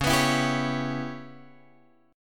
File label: C sharp Minor 7th Flat 5th C sharp Guitar Open F; C#m7b5; strummed; x,8,11,11,10,8